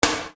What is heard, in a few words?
Snare Dr